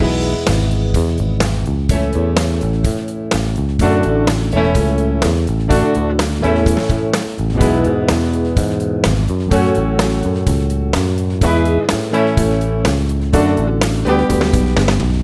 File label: session bass player; Studio One